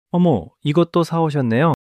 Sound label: surprise